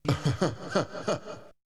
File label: Vocal; ZERO G - Datafile DISC 1